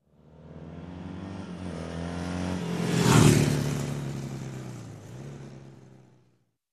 Motorbike passing by

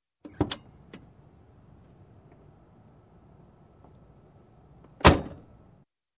Getting into Truck

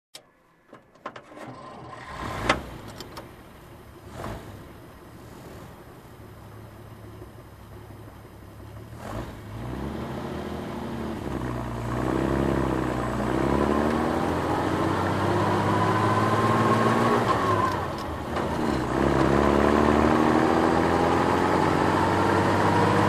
Military Truck driving around

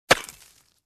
Smashing stone with a pickaxe

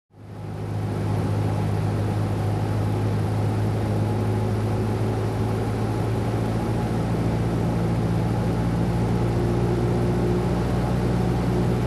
Aircraft Propeller Engine Noise Near

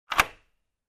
Compartment closing click sound

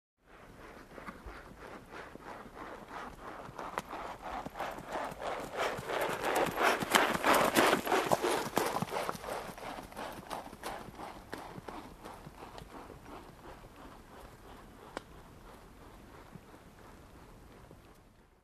Horse Gait passing by

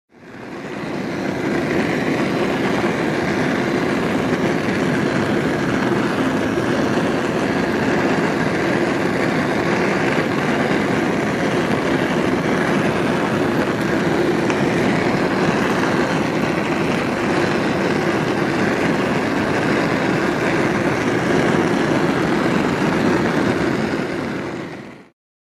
Flamethrower throwing flames

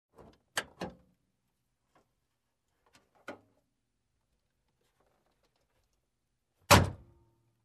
Closing Car Trunk

